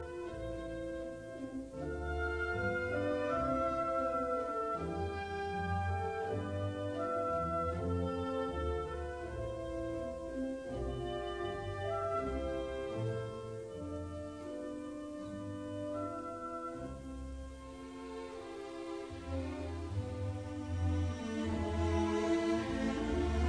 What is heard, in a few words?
Symphony in C major Andante Allegro ma non troppo; Schubert